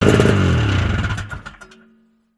Snowmobile Stop; Vehicles

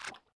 fs mud, footsteps

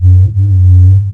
time warp; Quantum Redshift; XBOX; kutoo

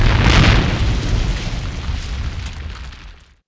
hit; XBOX; Crash Bandicoot The Wrath Of Cortex

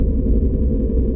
generator electric; Soldier Of Fortune II Double Helix; XBOX; ambience

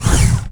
revert wood, skater; Tony Hawk's Project 8; XBOX

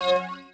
accept

